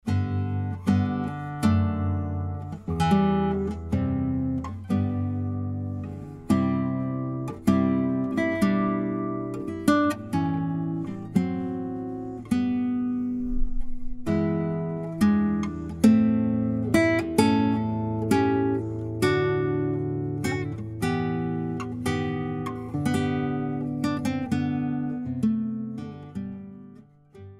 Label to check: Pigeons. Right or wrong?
Wrong